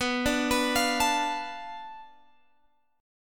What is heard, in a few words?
B Minor 7th Flat 5th B Guitar Open G